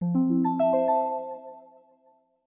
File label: Windows Notify Calendar